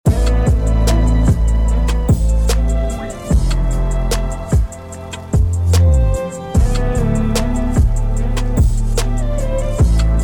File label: start round, music